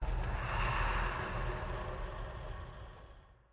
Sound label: reel spin; halloweenjack